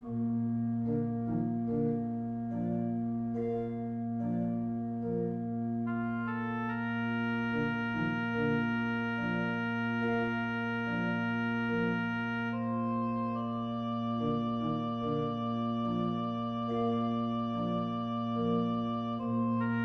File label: Humming Choir; G Puccini